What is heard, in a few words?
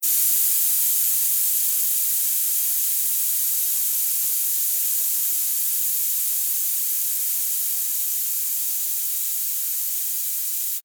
Pneumatic Release, Scifi; Air, Valve, Long, Science, Fiction; Spacecraft; BlastWave